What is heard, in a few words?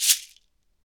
SHEKE, Afro Shakers, Shekeres